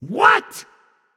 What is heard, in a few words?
confused; smite; ahpuch